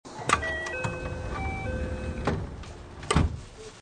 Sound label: door